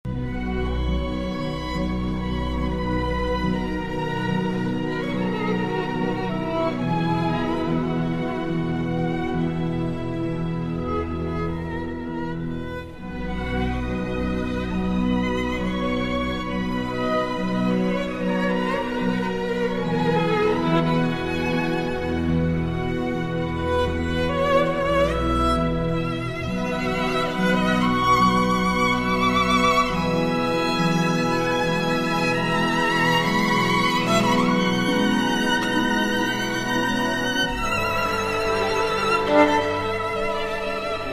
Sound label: violin concerto, excerpt